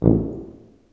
TUBA, Solo Brass, Tuba stacc

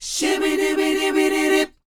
SHOO BE, Vocal R&B Dance; Spectrasonics Vocal Planet

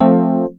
wurlitzer, dx7

